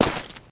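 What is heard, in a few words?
player gravel, footsteps; csgom; cstrike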